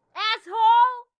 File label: car reaction; Blind Drive